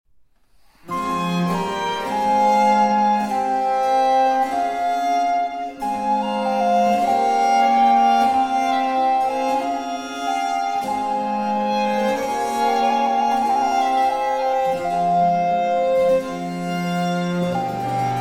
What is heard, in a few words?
Sonata For Recorder, 2 Violins & Continuo in C Minor, Largo